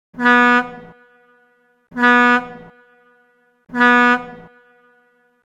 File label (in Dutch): drie keer kort